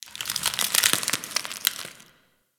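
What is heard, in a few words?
Ice Crackle Random Ambience; Warframe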